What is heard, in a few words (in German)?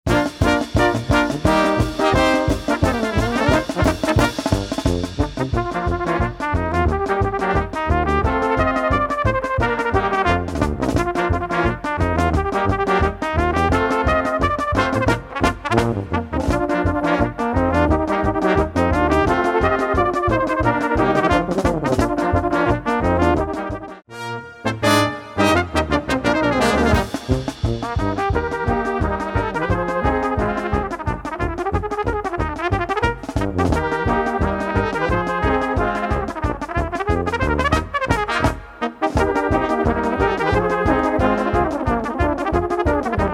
Fluegelhorn